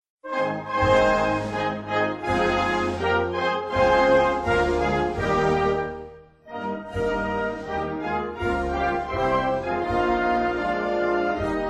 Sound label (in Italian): Inno Nazionale Svedese